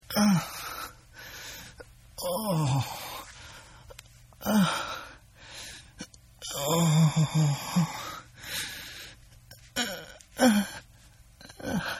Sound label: Moaning Male; Groaning; Sound Effects:Voice